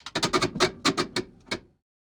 Ambience Scatter Machines; Josh Journey Darkness Totems; PS4, Switch, Windows, Xbox One gamerip; Santuario

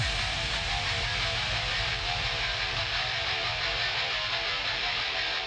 Theme Heavy Metal

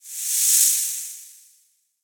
Animal Snake Hiss

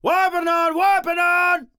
Enemy Generic Human Spawn Army

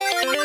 Top-Down-Rpg Chest-Open Rev

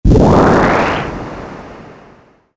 fx ship water; starbites

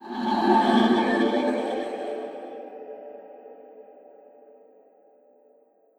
lament; Sarco Isislament2

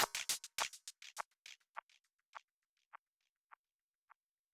music endloop percus; music ftue endloop percus 06

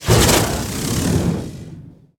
Sphere shake; Likho

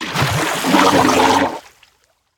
Swamp boss Show

